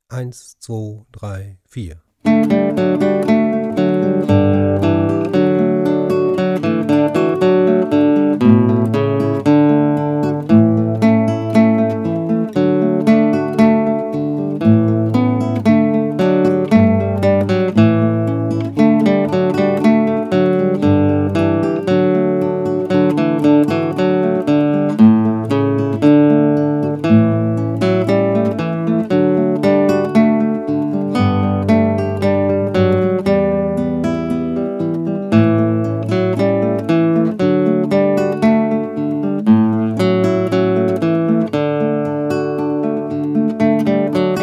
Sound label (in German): Froehliche Weihnachten, gitarre